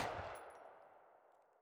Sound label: uzi rev; weapons